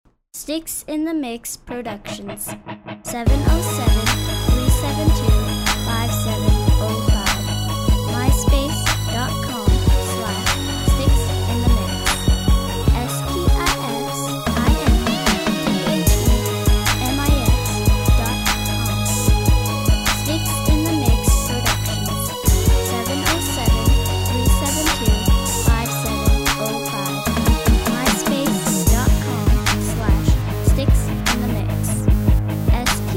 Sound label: synth rock